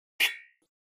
Button Beep Shrt Al, alarms

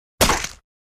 Sword Or Bayonet Juic, stab

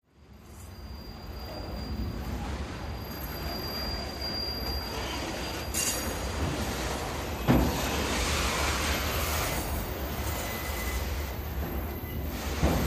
Train By Slow

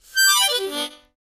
Mouth-organ, Glissade, Type 7 - Descending; woodwinds

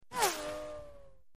Right To Left Tonal, bullet bys

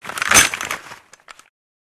Gun Sudden Turn, handgun mvmt

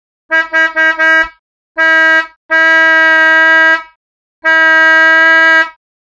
Horn Coach Horn Long S

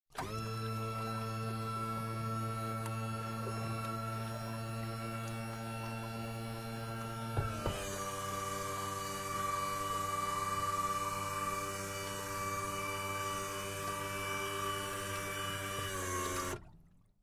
Servo Raises Outboar

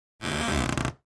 Creak; crashes & creaks

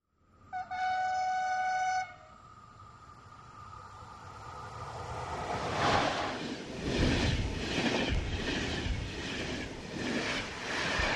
Electric Train ( European )

